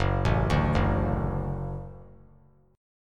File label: Gb Minor Sharp 5th Gb; arpeggiated; JGuitar